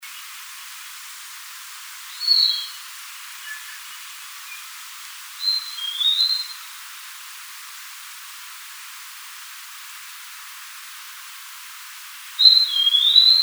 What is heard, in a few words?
Eastern Wood-Pewee